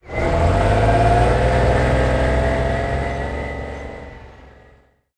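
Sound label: chieftain engine interior load, tanks; engines; War Thunder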